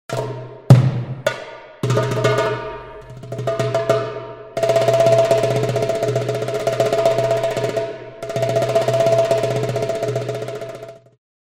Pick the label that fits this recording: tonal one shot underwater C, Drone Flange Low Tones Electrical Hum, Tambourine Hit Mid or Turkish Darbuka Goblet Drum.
Turkish Darbuka Goblet Drum